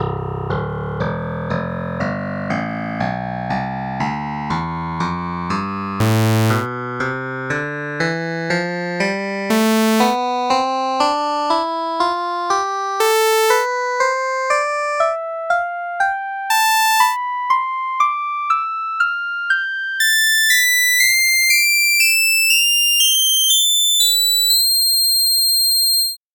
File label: charang tonleiter